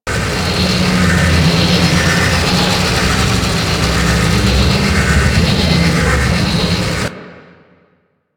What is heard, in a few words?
Prison Tower Loop; Ambience; Warframe; Corpus Gas City; Gameplay Remaster